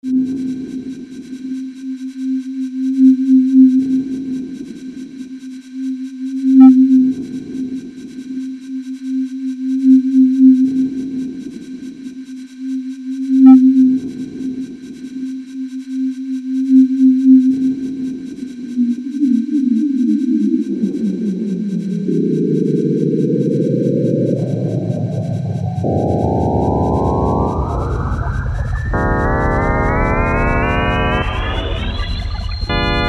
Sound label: scary train